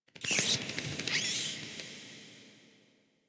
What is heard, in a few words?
Interior Cave Bat; Warframe; Ambience; Duviri